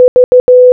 V Morse Code Audio

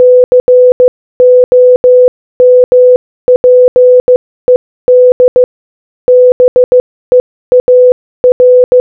COMPED BEAR Morse Code Audio

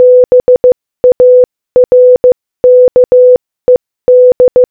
BARKED Morse Code

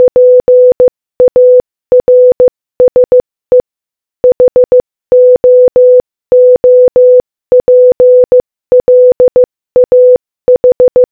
PARSE HOOPLAH Morse Code

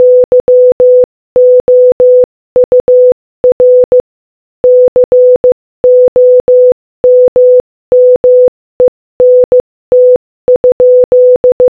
YOUR COMMENT Morse Code Audio

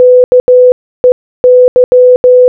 KEY Morse Code

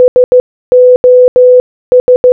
SOS Morse Code Audio

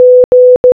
G Morse Code

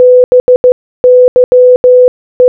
BYE Morse Code

